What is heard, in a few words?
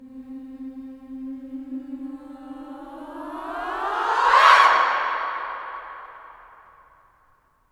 FEM SWOOP, SWOOPING